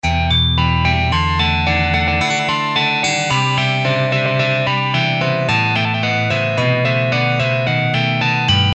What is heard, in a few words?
Lick